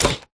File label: control room panel activate; Titanfall 2